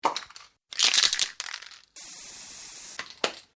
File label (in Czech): sprej